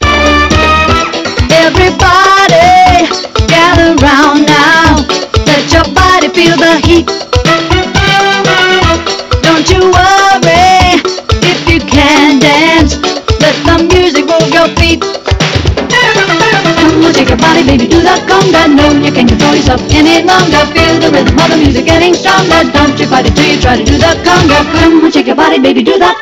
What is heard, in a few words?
conga; balon pojoci; tropical drink; sing a tune